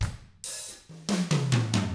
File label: Double Platinum Drums, COUNTY; ILIO; Double Platinum Drums 1